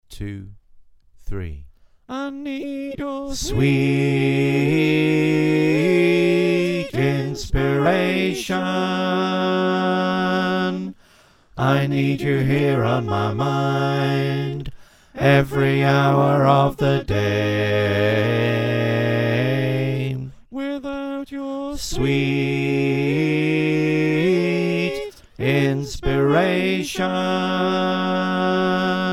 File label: Bass, choir